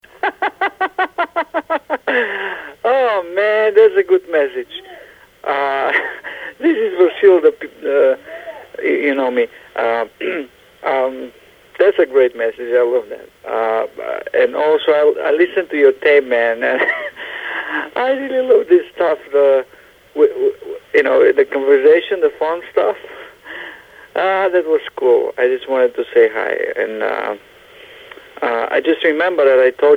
Laughs

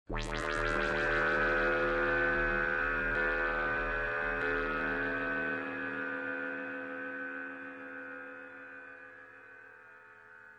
march

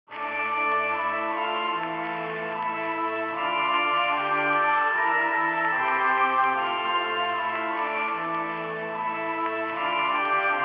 instrumental; Vocaloid; Hatsune Miku

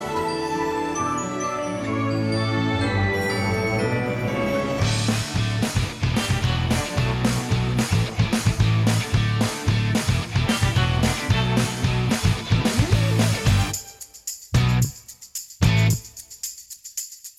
Theme Song Instrumental; MLPFiM; Everfree Radio

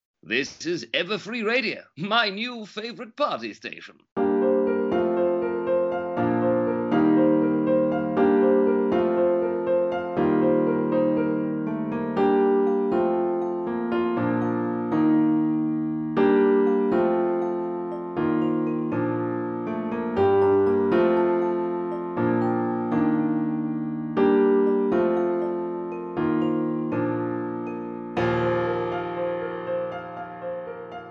Piano Cover; Everfree Radio

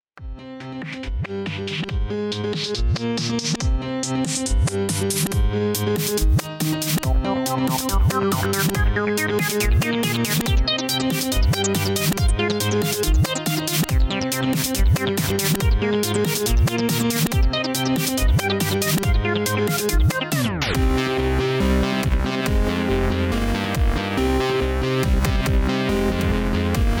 Intro Remix; Everfree Radio

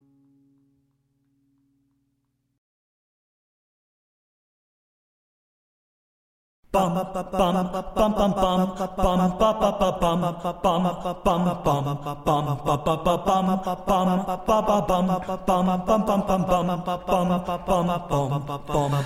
Acapella Remix; Everfree Radio

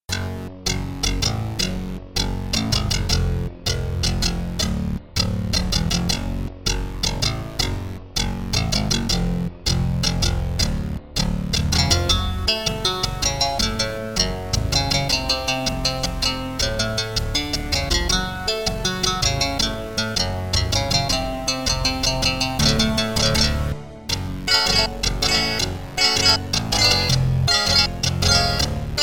Guitar Cover; Everfree Radio

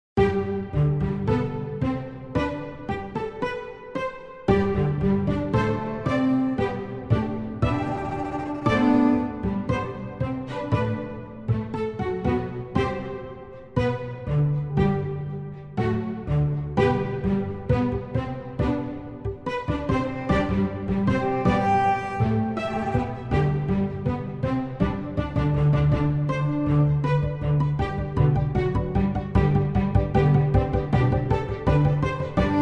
Cello Instrumental; Everfree Radio

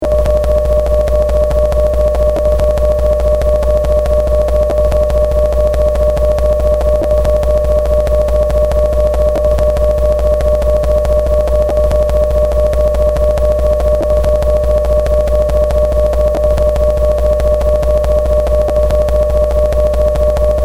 violins

